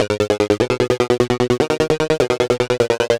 150 Phat Club Synth, 150bpm; Combi Loops; Nu Dance Electro

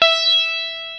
el sitar; E-MU Formula 4000 Series Vol. 3 – Analog Odyssey; VK+ Instruments

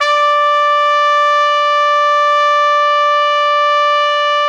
D4, Pop Brass; Best Service ProSamples vol.19; AKAI

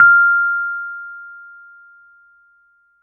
F6, Rhodes Mark II; mkII 88 key HI; CEPCo Sample Series Vol. 3

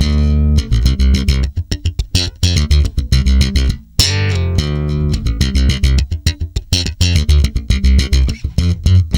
D, tweaky, bass; Spectrasonics; Bass Legends

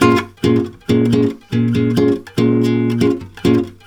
A# MIN, Brazil Bossa; USB Soundscan; AKAI

